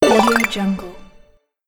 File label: game ui upgrade rank